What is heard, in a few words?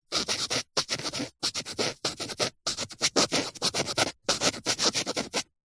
foley foam scratching or writing on